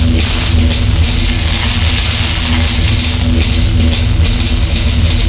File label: elec damage loop